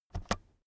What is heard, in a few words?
keyboard external space press fast soft